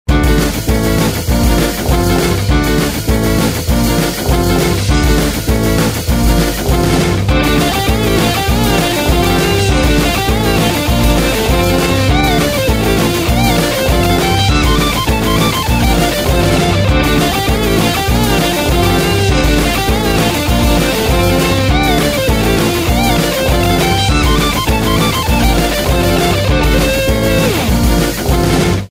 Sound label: Ska